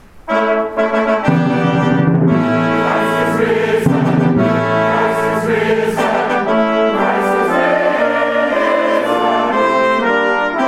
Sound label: Easter Fanfare